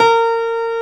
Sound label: STENWAY; East West; Global Sounds